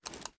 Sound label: sm uzulu reload empty scope rattle; reloads; iw8; gmod